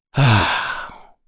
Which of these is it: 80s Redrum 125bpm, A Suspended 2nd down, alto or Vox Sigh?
Vox Sigh